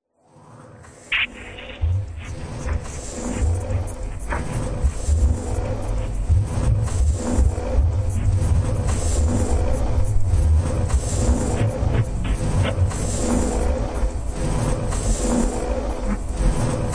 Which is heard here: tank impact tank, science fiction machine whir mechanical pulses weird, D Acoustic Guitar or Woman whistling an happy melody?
science fiction machine whir mechanical pulses weird